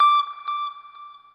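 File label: NOODLE SHORT, Guitar & Bass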